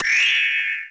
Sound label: costume; Microsoft 3D Movie Maker